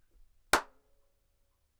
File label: Snares & Claps, One Shots